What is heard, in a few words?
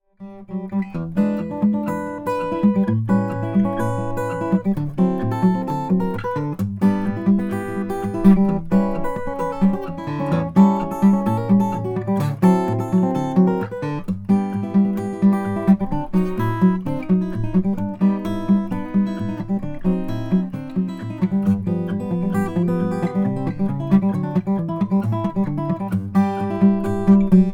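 steel string flattop cocobolo, guitar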